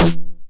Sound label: 909 SD Tape Sat